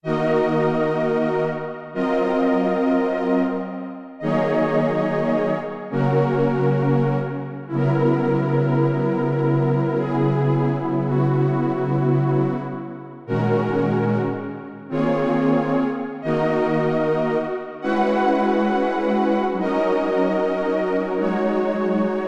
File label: Yamaha YS-200 Wide Strings